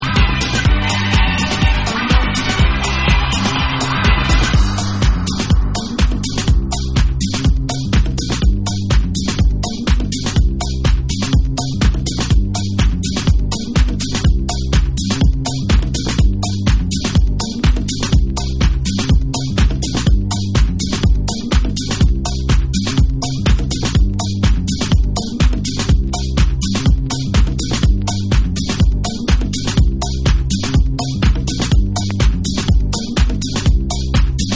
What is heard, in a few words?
Dubstrumental Mix